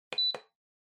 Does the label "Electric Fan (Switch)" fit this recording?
Yes